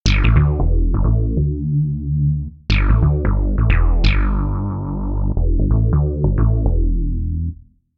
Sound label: nitron chubbyslap; best service